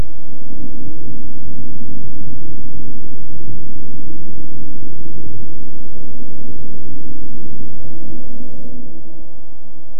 a spaceship interior soun

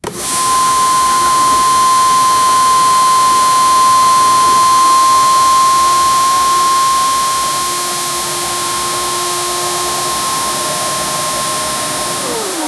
using a duster, cleaning; using a swiffer duster

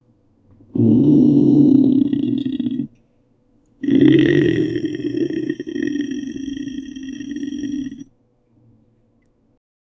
a hungry stomach growl

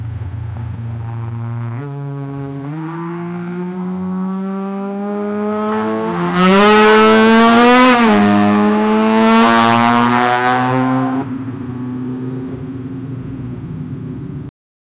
the sound of a sports, sports car

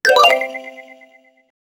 game button press, arcade

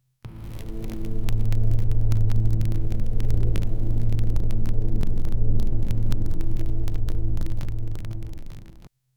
soft ambient hum emotiona

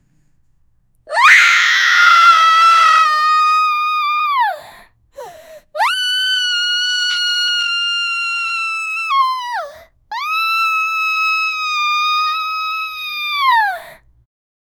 girl scary scream; female

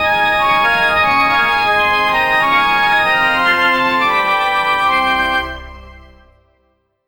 creepy circu

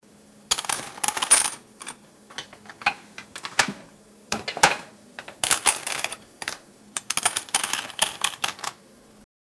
cash out money digital wi, money

